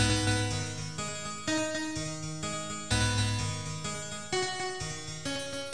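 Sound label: harpsichord; RAMPAGER; REDNECK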